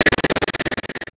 Title Transition Chopper; Production Element Title Transition

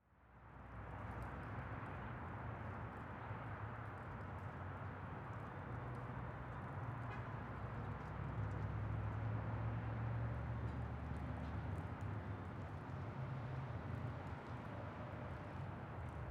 Ambience Parking Lot Not Busy; AdobeCC

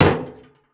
Household Metal Garbage Can Set On Concrete

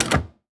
Electronic Buttons Arcade Game Buttons Single

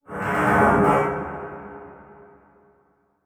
Transition Whoosh Fire; Production Element

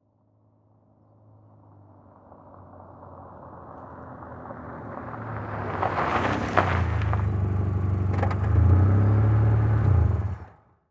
Auto Saturn Pull Up Stop Dirt Road Slow; Transportation

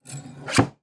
Household Paper Cutter Blade Pushed Down

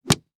Impact Blanket Hit By Wooden Stick; Impacts

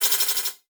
Multimedia Internet CD-Rom Flash Click Multiple

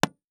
Impact Book Hit By Small Metal Pole

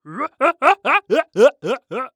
Cartoon Male Belly Laugh; AdobeCC

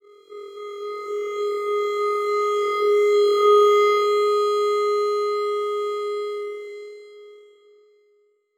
Sci-Fi Electronic Radar Sweep Slow; Science Fiction

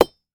Impact Machete Hit On Log

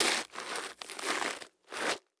Horror Scary Violence Butcher Knife Stab Body

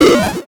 Flash Wipe Electronic

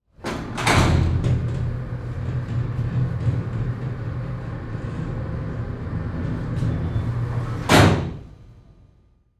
Security Door Open Sliding; Emergency Effects; AdobeCC

